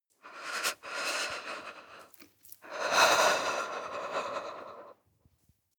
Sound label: breath female shiver